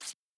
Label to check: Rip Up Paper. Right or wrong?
Right